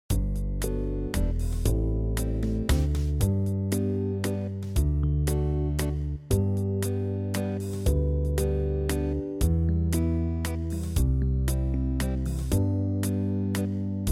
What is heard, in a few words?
ookpik waltz